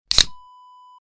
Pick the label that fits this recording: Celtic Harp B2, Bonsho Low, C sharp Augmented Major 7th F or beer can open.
beer can open